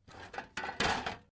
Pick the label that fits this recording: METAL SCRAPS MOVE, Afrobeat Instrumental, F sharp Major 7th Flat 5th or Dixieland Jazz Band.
METAL SCRAPS MOVE